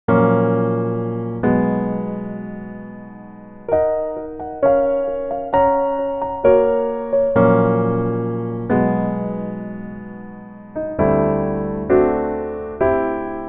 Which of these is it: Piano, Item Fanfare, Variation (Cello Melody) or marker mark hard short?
Piano